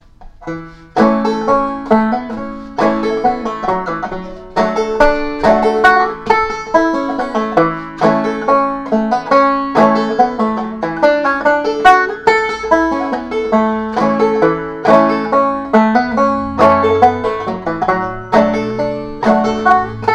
bill cheatham, banjo

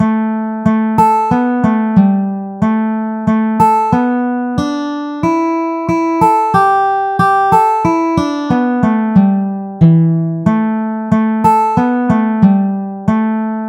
betsy likens, banjo

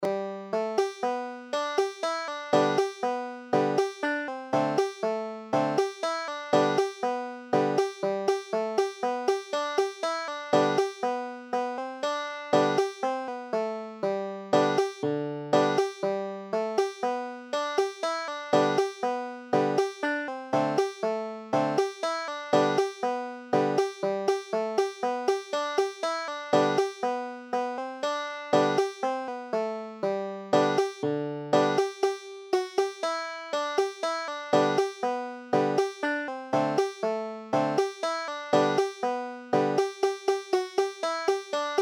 buffalo gals, banjo

